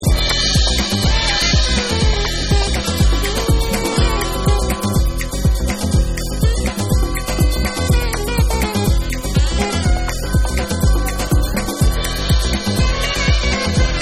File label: Funk Dub